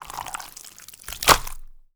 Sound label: Borax, Impact, Slime, Gore; Mattia Cellotto; The Borax Experiment